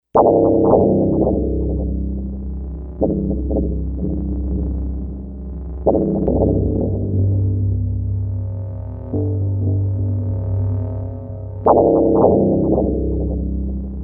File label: Terror Drone; Best Of N2O Library; Drones & Foundations